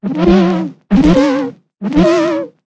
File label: Hurt Voice; Wagstaff